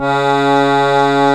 TACK PIANO

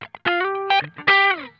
GLIDE, Funk Guitar; Zero G - Funk Guitar

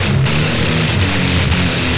Treat Guit, Industrial Nasty

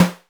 GOOD SNARE, DRUMS; Music Store Sound Service; Einstein

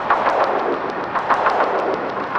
Rhythm Noise, 100bpm; rhythmic inspiration

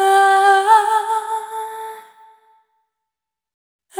Single Voice Melodic Loops, Vocal Samples

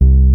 Dub Bass C2; Mo' Phatt; Digital Sound Factory Mo' Phatt

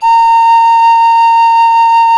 PAN A 5, panflute; SoundBytes - Early Patches

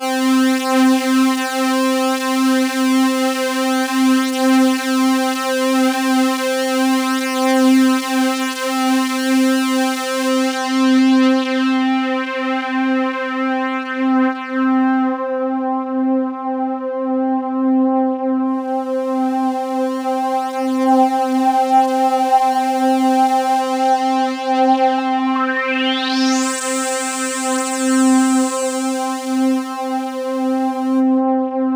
C3, Roland JV 2080 CLUB ORGAN

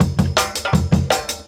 Clunger; Original Jungle Breaks

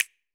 F.SNAP, FINGER POPS; AKAI S-Series CD-ROM Sound Library VOL-5

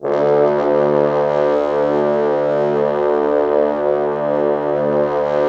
Orchestral Brass

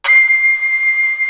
SOLO VIOLIN; East West; Global Sounds

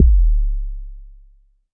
old sch; Roland MV8800; MACHINE KIT1

